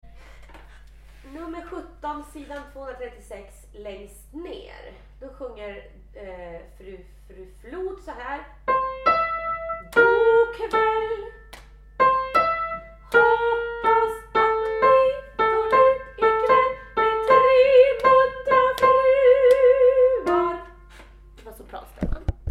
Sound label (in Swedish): Muntra sop